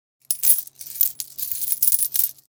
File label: Casino coins being moved around in hand